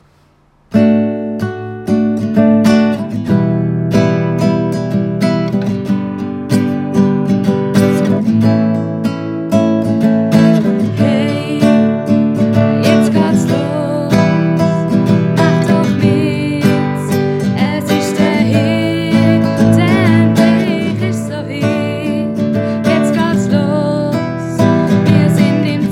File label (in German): Zirkuslied Sologesang